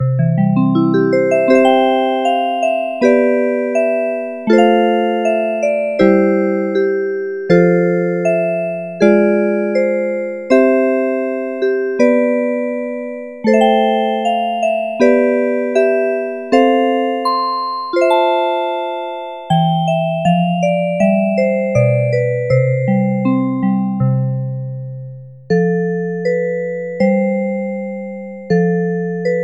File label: Healing music box